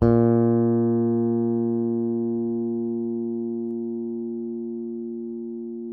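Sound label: Yamaha fingered A#2, Bass; Masterbits CLIMAX 7; PATITUCCI BS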